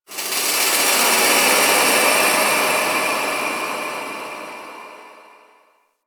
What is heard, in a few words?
Texture Small, Ambience; Warframe; Orokin Moon